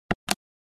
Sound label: Sharp EL 8 old calculator single button press